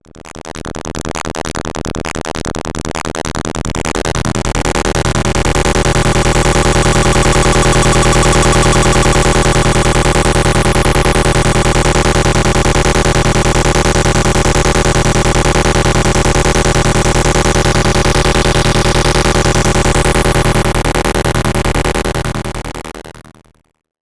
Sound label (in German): Drohnung; Body Synths Metal Fetishist